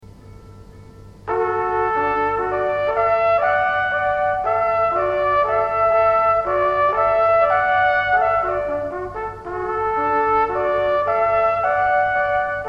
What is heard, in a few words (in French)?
trompette naturelle